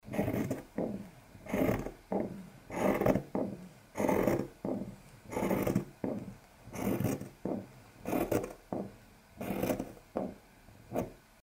tolst tkan nojnicami

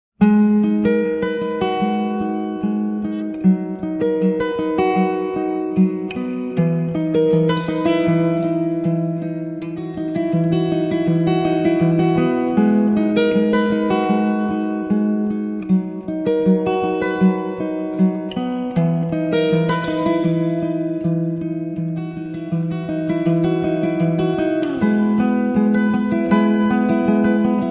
tragicheskaya i grustnaya muzyka

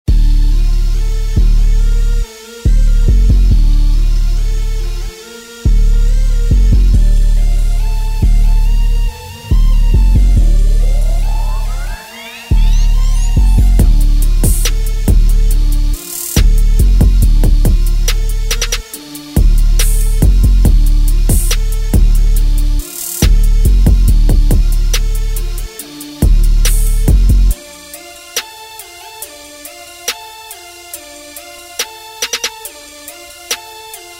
rep muzyka bez ap

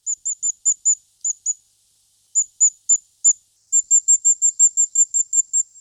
korolek krasnogol

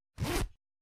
zvuk shyrinki

